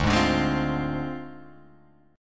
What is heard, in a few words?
D Minor 7th Flat 5th Guitar Open G